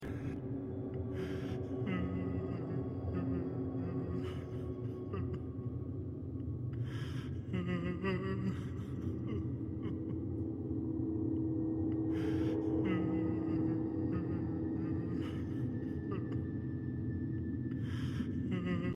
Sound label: trying not cry; scpsl 096